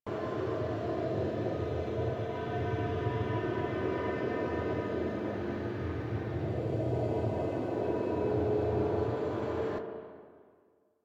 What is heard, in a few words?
Rail Jack Back Engine Room Ambience; Warframe